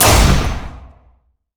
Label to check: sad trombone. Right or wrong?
Wrong